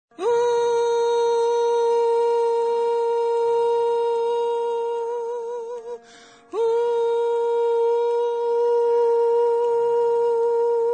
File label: tenor